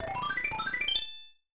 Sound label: Chamber Open; SMAS; SMB3